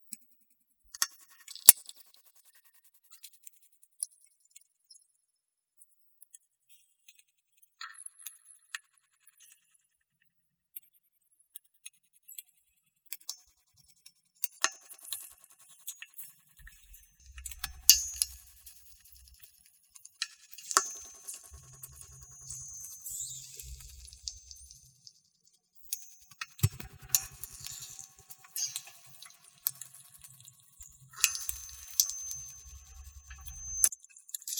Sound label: ice bubbles; noise reduced